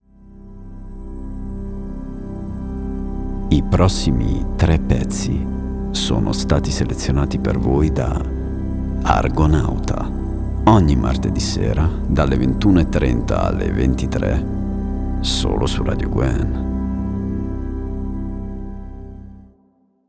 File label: Jingle